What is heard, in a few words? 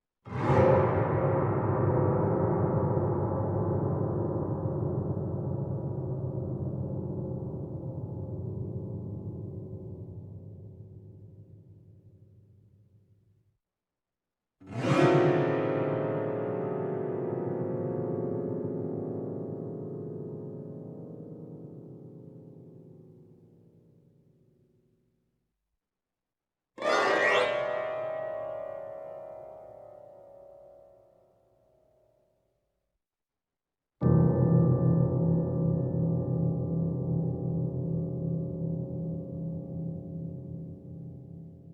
Piano LOW Gliss Suspense; Electa Quinney